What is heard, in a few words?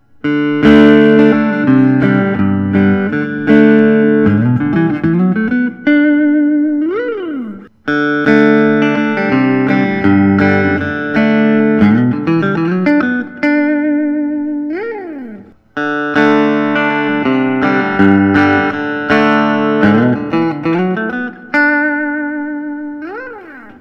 thorn g t solid rosewood